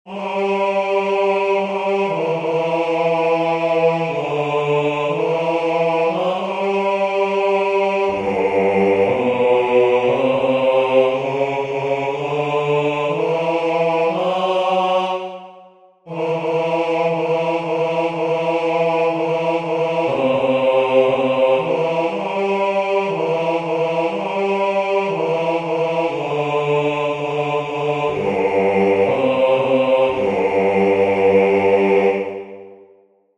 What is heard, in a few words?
bass